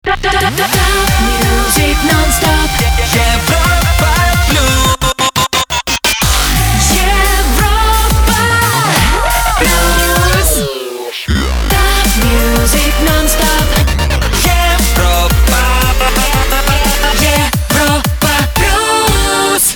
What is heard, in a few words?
Jingle Main ID; Europa Plus